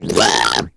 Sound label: female boomer warning; voice; Left 4 Dead 2